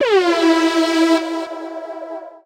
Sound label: Airhorn Verb